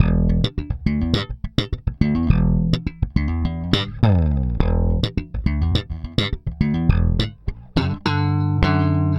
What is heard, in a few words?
Slap, Bass; Bass X